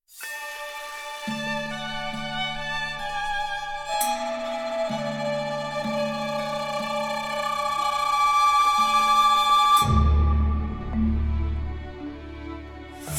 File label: Postcredits Music Stem